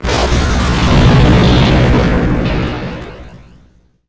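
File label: counter measures explode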